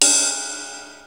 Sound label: DDD1 RIDE; Hollywood Edge; The Big Bad Beatmachine